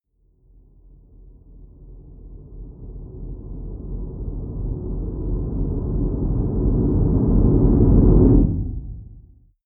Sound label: deep noise synth rumble short, Risers; whooshes rises